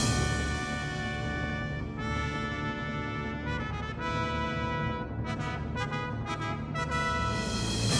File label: scoring pack rock pop orchestra